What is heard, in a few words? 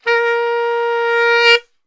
A#2 SAX, Brass Super Section; Best Service